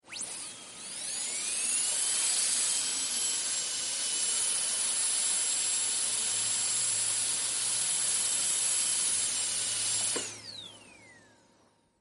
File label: dentist drill